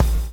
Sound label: BLOW; Spectrasonics - Backbeat